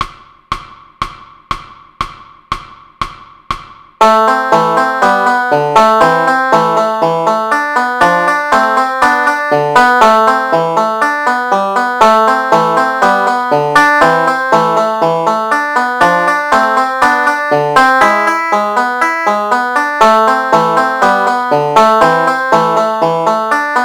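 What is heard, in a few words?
Banjo Melody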